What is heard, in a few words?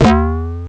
BUMPER; Sonic R; Windows